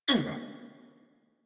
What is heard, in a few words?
Pain; New War; Kahl